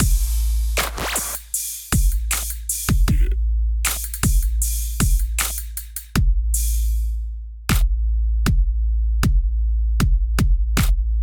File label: Dance Faction Kit groove 78 bpm